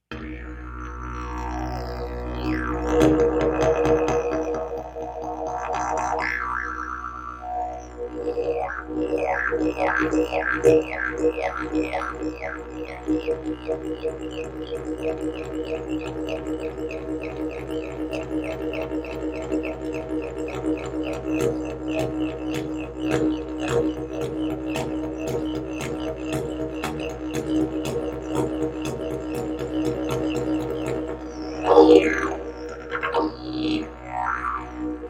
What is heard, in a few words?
didge